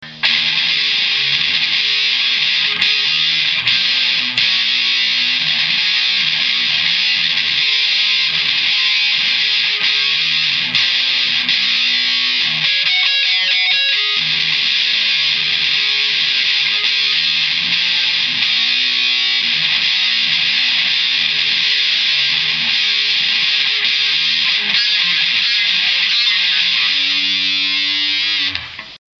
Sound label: distplus